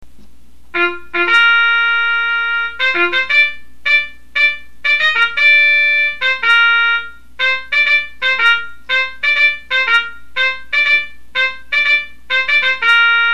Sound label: Trump